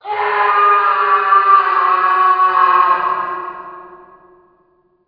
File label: inmate agony; Earth and Beyond; EA Games